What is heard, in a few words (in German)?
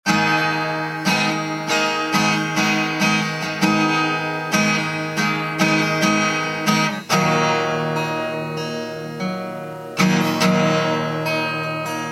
Gitarre; RecordM